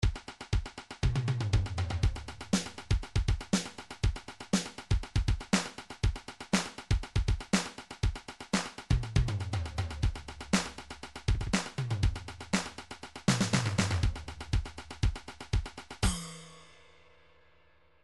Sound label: midi drums electro